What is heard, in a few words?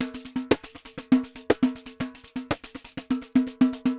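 pandeiro, DRUMS SAMBA; Best Service ProSamples vol.14; AKAI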